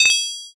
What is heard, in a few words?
counter